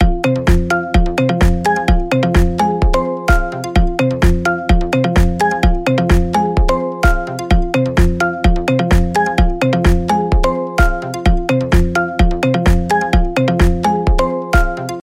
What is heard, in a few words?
Upbeat Tempo